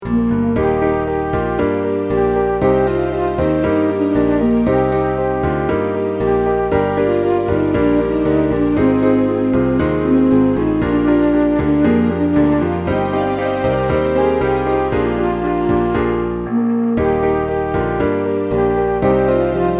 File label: 60s romantic ballad